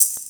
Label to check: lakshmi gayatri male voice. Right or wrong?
Wrong